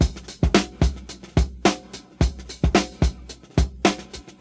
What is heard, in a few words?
109 bpm breakbeat c key